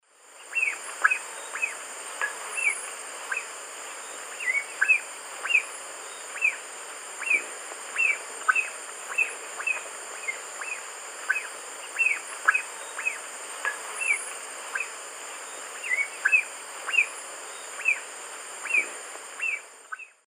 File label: Pipile pipile, tew calls; S. America; Eastern Lowlands